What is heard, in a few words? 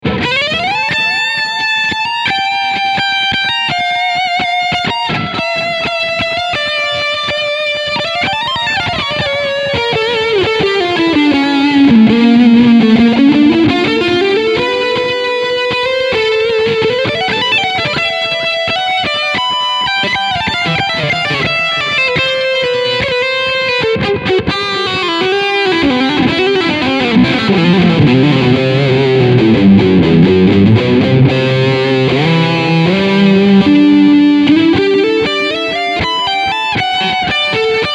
Leadsound Delay